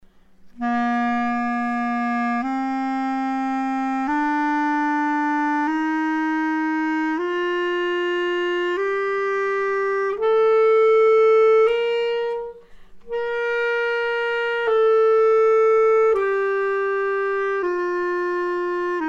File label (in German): Klarinette